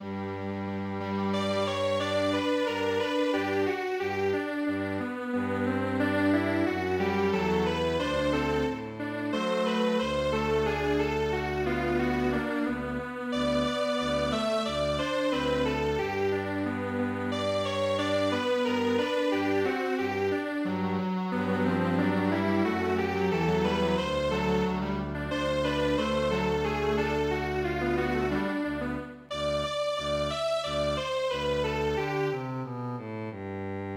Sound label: Mexican hat dance Strings Quartet